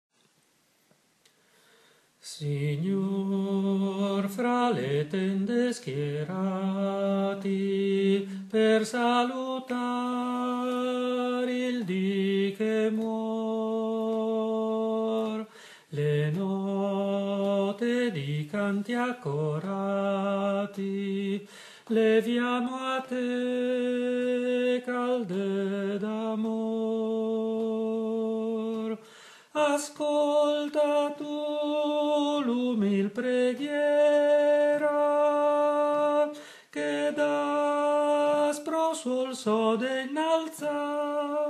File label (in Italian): Canto del tramonto